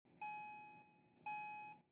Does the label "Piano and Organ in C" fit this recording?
No